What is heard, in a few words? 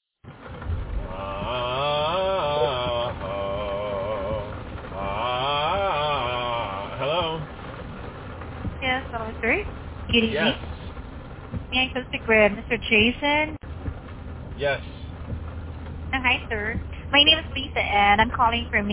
Vocal Training Exercise